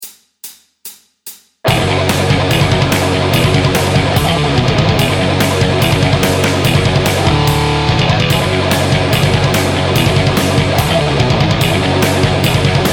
Slicing Metal Guitar Riff

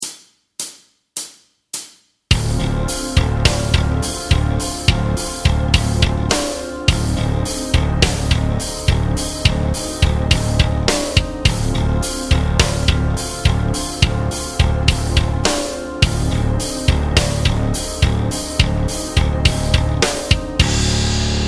Dorian b2 Backing Track

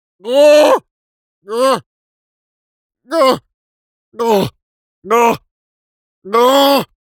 guh

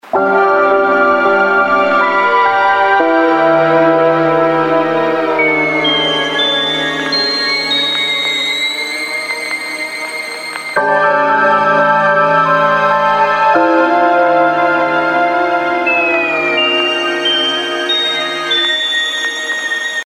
Interface Ringtones

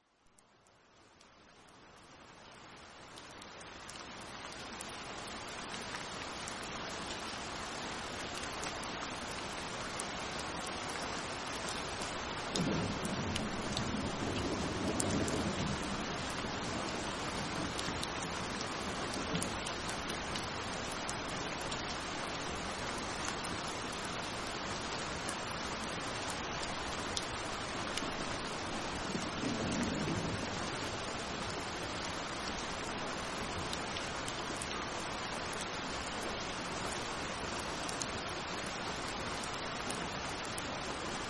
Powerful Rife Frequencies Relaxing Rain; Detox Heavy Metals; Liver & Kidney Protection

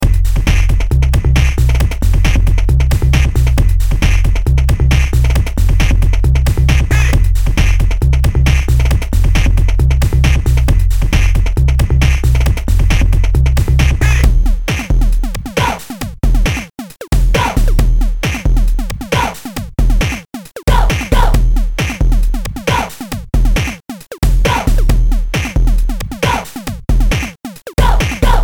tango

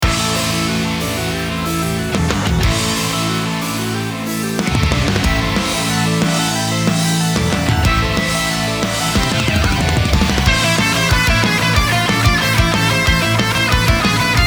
Metal; SNES Medley; Metal Max; Boss

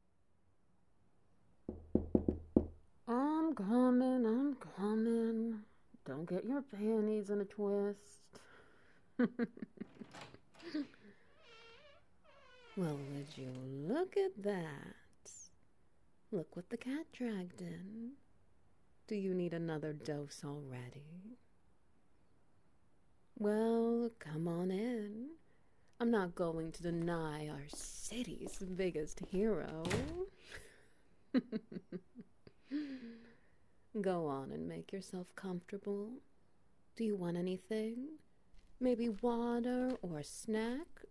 Ambience SFX Wet Noises; The Enhancer

